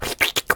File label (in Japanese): ペロペロ音; 音MAD; Cookie